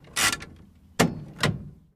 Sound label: download Sound, Chevrolet Corvair, Interior Pov, Set Parking Brake Fast, Release.